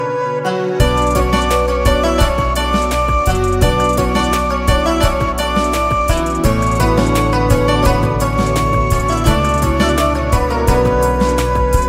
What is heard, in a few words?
Song Instrumental Ringtone